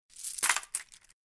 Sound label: Coin Rattle; Rummaging coins in hands. Coins rattling.; Money Sound Effects; Lynne Music